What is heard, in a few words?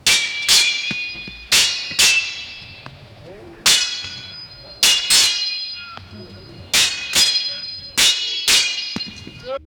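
medieval battlefield ambience, clashing swords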